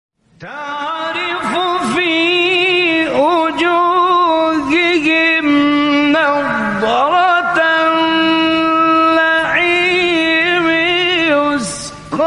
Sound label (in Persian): تلاوت زیبای سوره مطففین; استاد راغب غلوش